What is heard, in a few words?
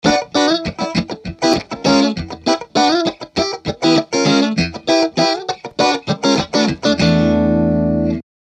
Sly Funk; Combo2